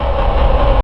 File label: Indus; PCGamer50